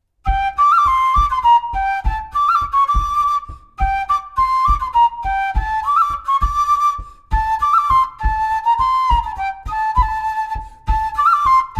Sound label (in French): Bourree; rec Morange; Para lou loup